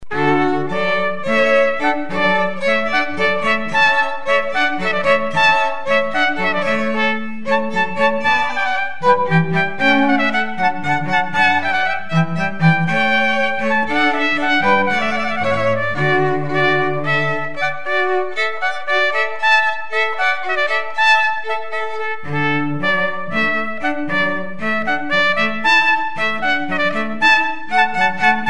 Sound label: Hornpipe Strings and Trumpet Trio